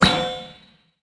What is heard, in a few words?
Bump Bounce